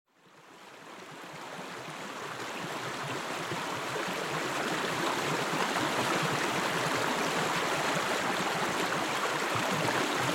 river severn hydrophone recording at the hafren forest